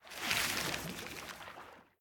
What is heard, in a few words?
water under swim; sobek; smite